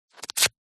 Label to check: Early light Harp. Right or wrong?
Wrong